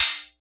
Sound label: good hat sound sample f sharp key, hi-hat; hat samples